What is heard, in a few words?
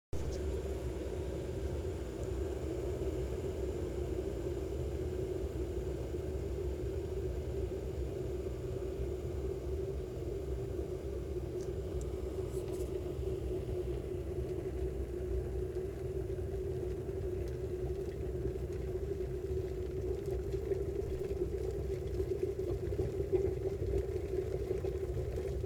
Kettle Boiling